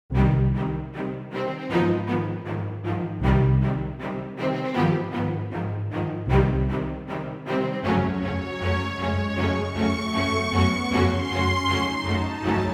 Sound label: Epic Orchestra strings